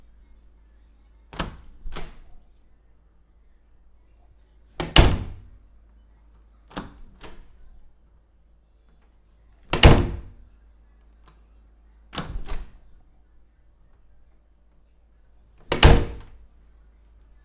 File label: Door Open And Close Stereo